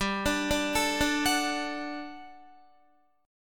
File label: G Major 7th Suspended 2nd, Guitar; GM7sus2; arpeggiated; 14,17,14,14,14,14; 4F,4A,5C,5G,5C,6E